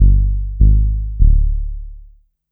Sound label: BASS LOOP, Drum & Bass, 150 BPM; Ueberschall; Drum & Bass Resonance